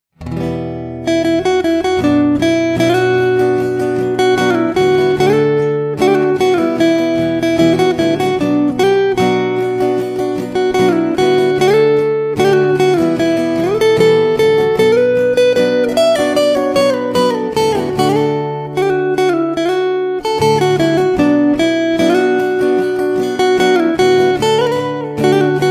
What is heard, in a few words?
Guitar